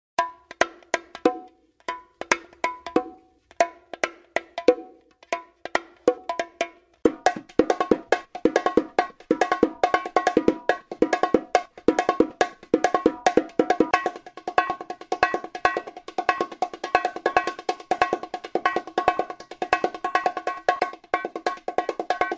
Bongo drum

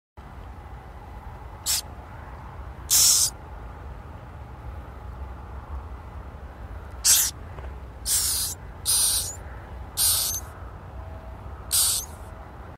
Moles

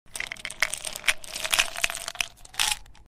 Pill bottle

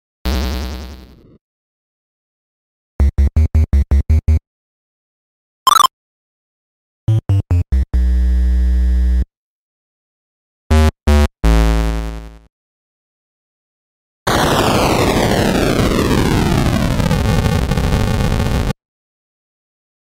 Retro Games

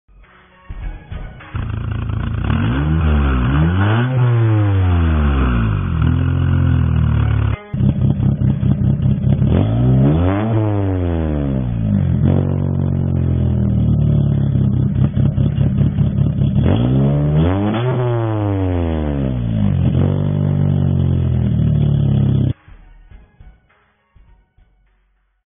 Exhaust silencer

